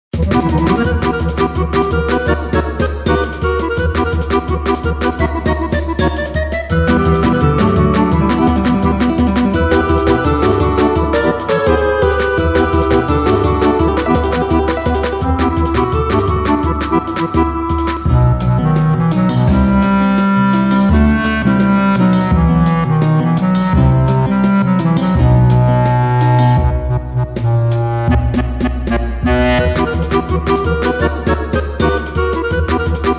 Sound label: Klezmerize; gunman clive 2